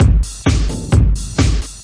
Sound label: 130 bpm werk, drum loops and breaks